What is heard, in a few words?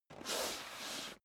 step dress boots metal dry slide long; schritte; Footsteps; menschen